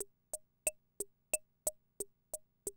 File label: CR78, DrumLoops